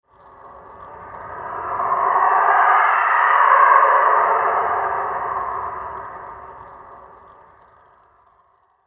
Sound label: low rising flanger